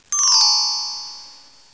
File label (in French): succes